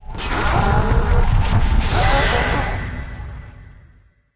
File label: dead, monster